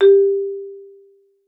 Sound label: marimba G4; Xsample VOL-4